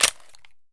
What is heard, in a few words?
mp5 bolt in slap; Escape from Tarkov